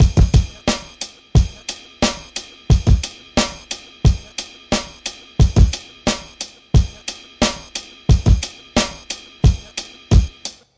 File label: DRUM LOOPS and BREAKS, 089 bpm; salad toss